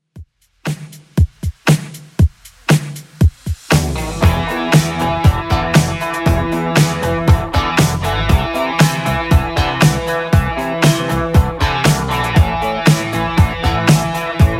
80s Rock Redrum 118bpm

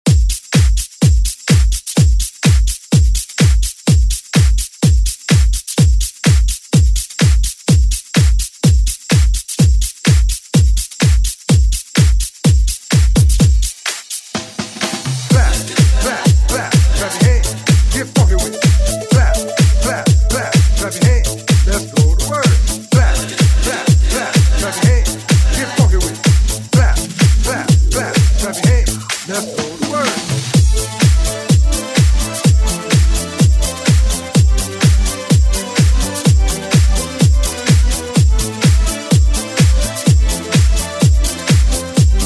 Party Hype Redrum; Deejay Hit

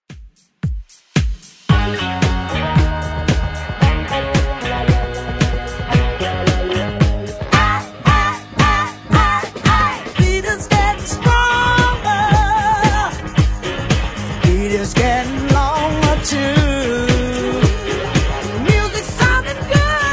70s Redrum 113bpm

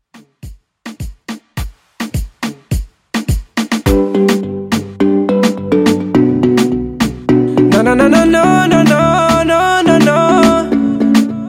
Latin Redrum; Remixfreakz

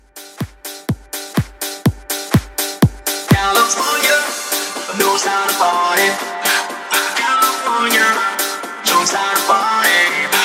Transition 124 92

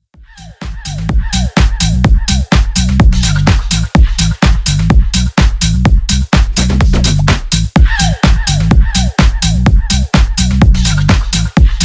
Bass House 126bpm

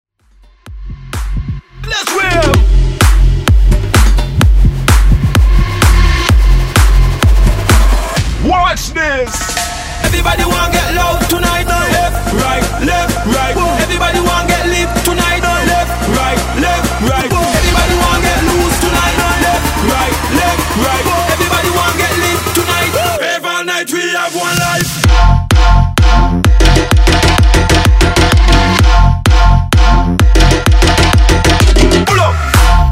Wave Jump Mash Up

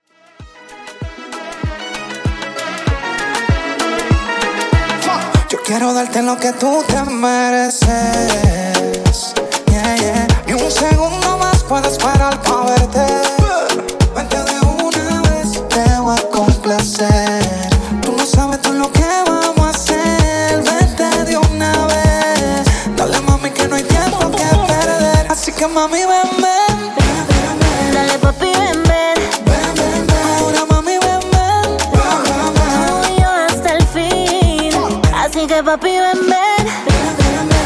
Afrobeat 97Bpm

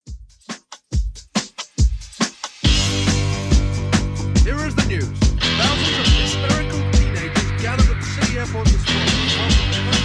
70s Redrum 145bpm

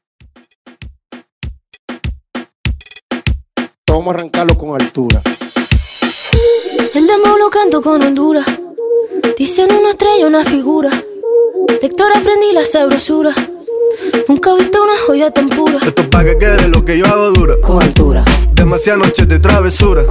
Reggaeton; Redrum

